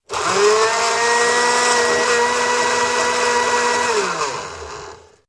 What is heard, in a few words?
Blender Strt Run Stp, appliances